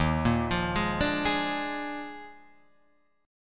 Eb Major 7th Suspended 2nd Suspended 4th down Guitar Open F; arpeggiated; EbM7sus2sus4